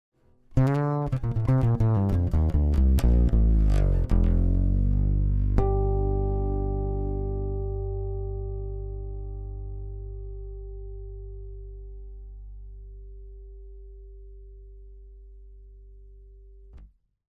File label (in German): soul passiv piezo